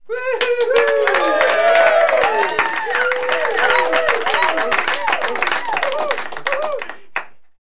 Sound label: cheering